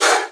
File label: sapper snap